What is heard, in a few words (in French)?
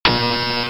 Alerte